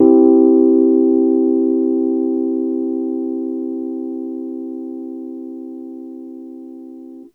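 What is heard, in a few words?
Piano Rhodes major soft; TrashLibrary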